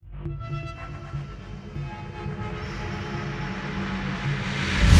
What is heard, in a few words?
Battle